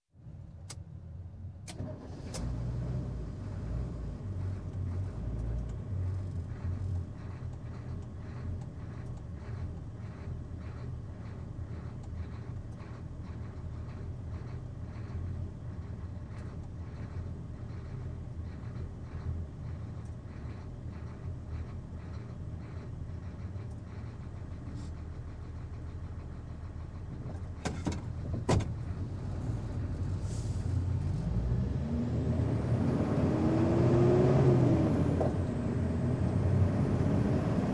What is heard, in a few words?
Truck Engine Startup Driving from Cockpit